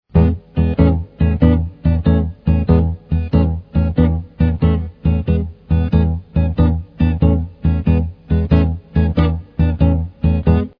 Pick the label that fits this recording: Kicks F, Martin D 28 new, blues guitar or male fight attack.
blues guitar